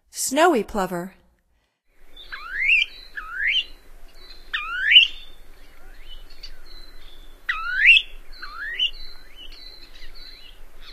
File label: Snowy Plover; North America; Stokes